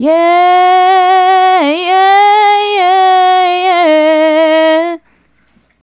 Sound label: Female Solo, Vocal